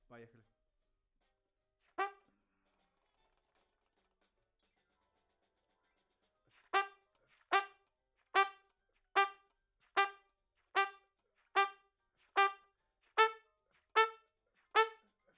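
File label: Trumpet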